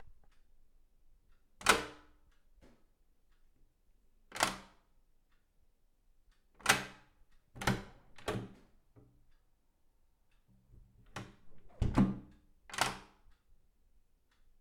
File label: Door Lock